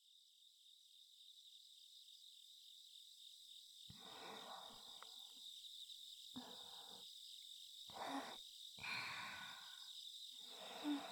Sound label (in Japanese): 耳舐め・フェラ; ボクとお姉ちゃんと大人になった夏休み